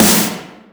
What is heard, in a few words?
Snares Reverb